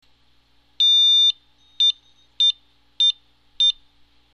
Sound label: pi- pipipipi